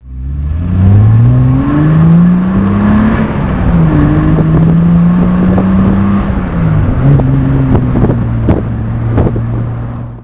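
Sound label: tr6 original drive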